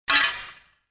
scaffolding collision; Angry Birds Space

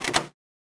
Item Lock; Idle Champions of the Forgotten Realms

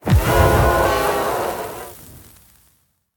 Ghost Death; The Town

